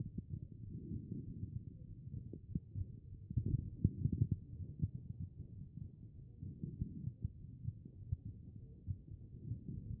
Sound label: HEART B MODE